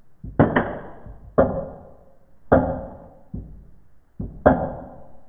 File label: church, monastery; soundscapes